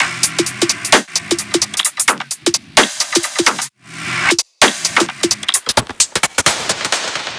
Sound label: 130 DRUM LOOP TOP